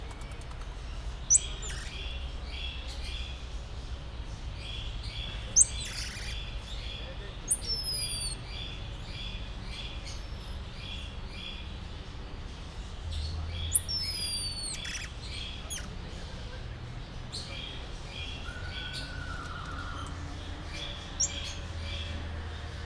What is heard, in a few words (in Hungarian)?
magas csipogásszerű hangocska, vöröshasú tamarin; debreceni zoo 2019